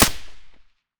m4a1 silencer mono distant, weapons

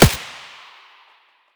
svu muzzle mono distant, weapons

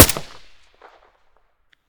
ak74u silencer stereo; STALKER; IWP

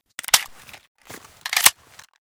beretta reload

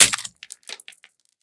pistol drop glass; STALKER; IWP

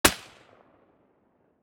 silencer sv98 mono far

